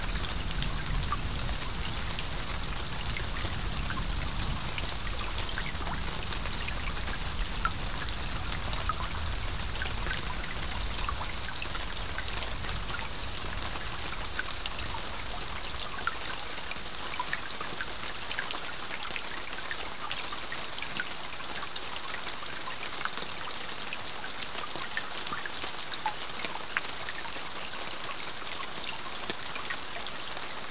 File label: Thunderstorm